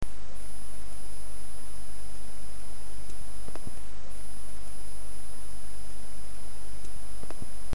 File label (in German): maus pfeift